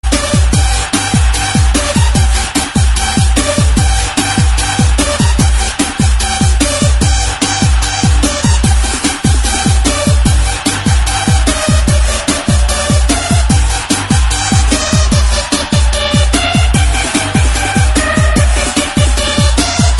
Techno

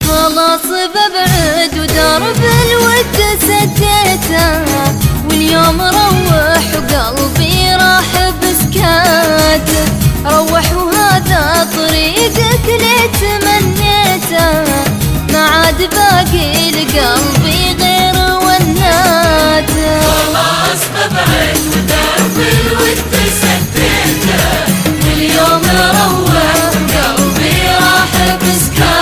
Sad Arabic Song

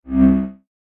Warning

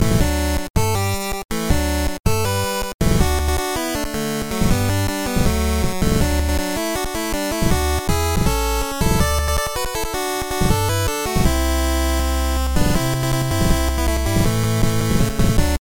8 Bit; Bad Horse

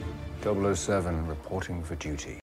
sms Tone

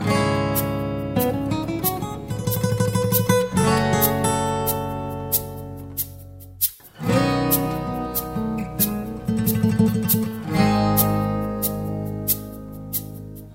Spanish Solo

